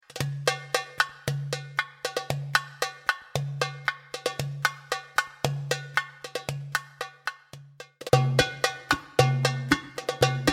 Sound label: maqsum progressive nay fast 115bpm